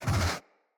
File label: Tame Idle Alert Snort; Enemies; Kubrow